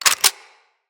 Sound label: Hand Shotgun Reload; Weapons; Corpus